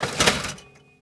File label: Lever; Max Payne